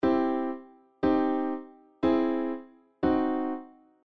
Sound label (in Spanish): los 4 tipos de triadas